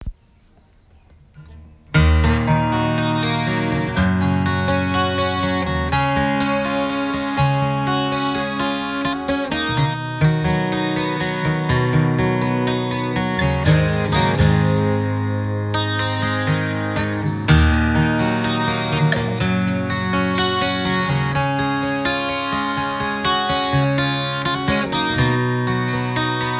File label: Guild F412 strum